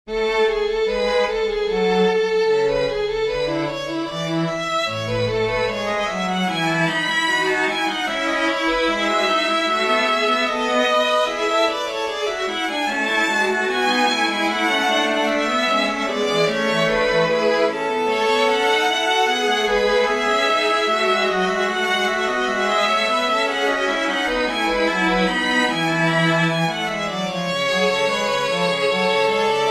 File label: sinfonia a major for string trio; bach johann sebastian